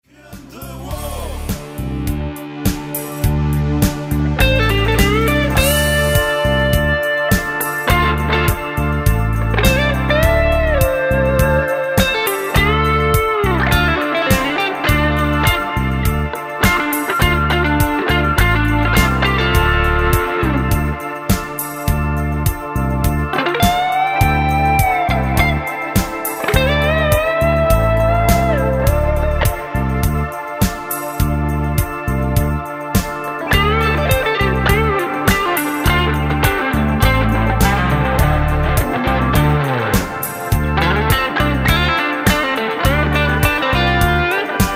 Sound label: wall Solo